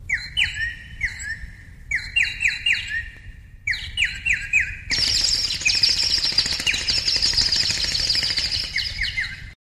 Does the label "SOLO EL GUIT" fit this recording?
No